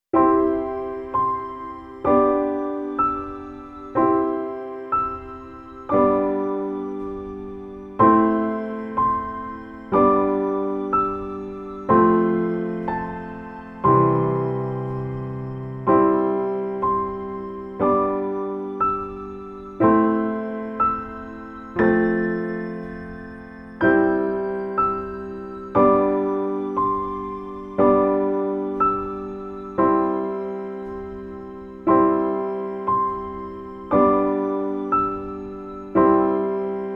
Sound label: Baby Lullaby Piano Sleep Music